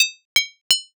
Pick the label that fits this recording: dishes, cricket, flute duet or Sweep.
dishes